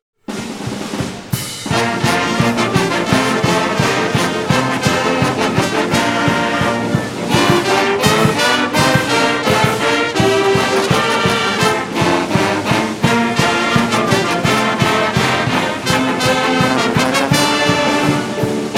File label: fight song; ucsd